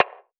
Percussion; Cymatics; Eternity Sample Pack; Drum One Shots

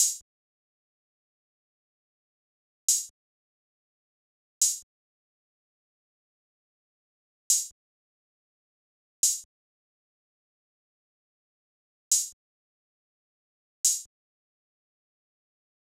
Drum Loop - 104 BPM Open Hat, RnB; Affection; Cymatics; ANALOG EVOLUTION Beta

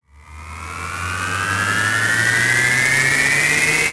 FX Rise, Deep House; Ghosthack; Advent Calendar 2019

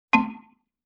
Xylophone Chord D; Vintage Cartoon; Musical Elements

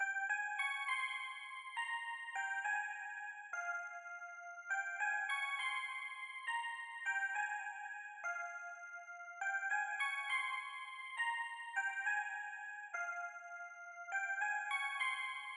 West Coast Melody Loop - 102 BPM C Min Melody; Melodies; Loop Stems; Cymatics; Oracle Sample Pack

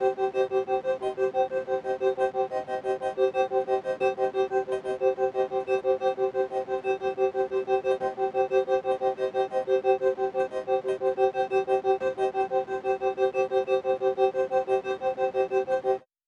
120 BPM C Min Texture; Cymatics; 2022 Melody Collection Platinum Expansion; Combat Zone

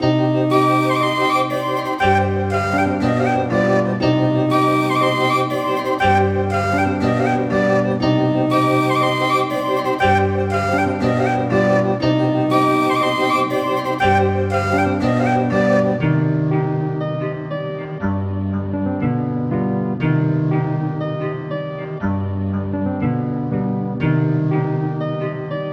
120 BPM C Min, Melody; Cymatics; 2022 Melody Collection Platinum Expansion

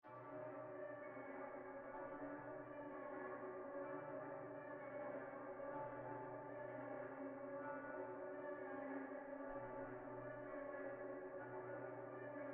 127 BPM B Min Strings; Trap Melody Loops; Loop Stems; Cymatics; Spirits

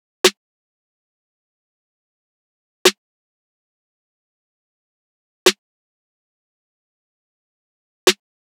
Drum Loop, 92 BPM Snare; Loop Stems; Cymatics; Vibes II Drum Loop Collection; Freefall Drum Loop

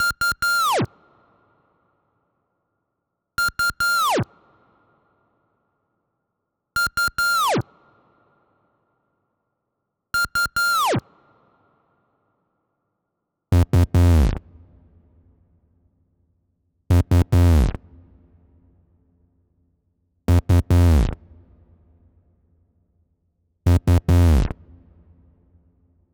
142 BPM F Min Synth, loop stems; Melody; Cymatics; 2022 Melody Collection; Wavy; Filthy

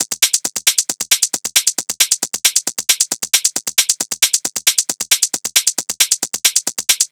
Hat Loop 135, Techno

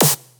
Snare; Missterious

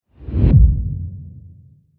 cinematic whoosh deep impact; Hits, Impacts; mixkit

